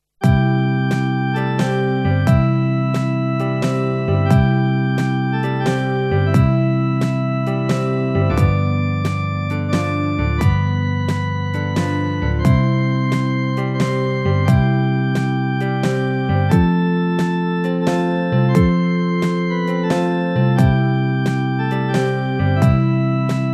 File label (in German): Stille Nacht Keyboard